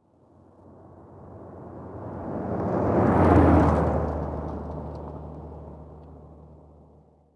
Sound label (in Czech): auto; Mafie 1